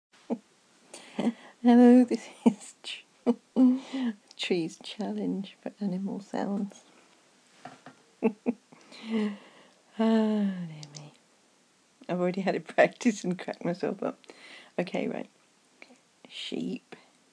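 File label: [F4A][animal noises]; for fun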